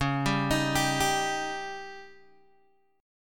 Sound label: Db Suspended 2nd Flat 5th Db down Guitar Open G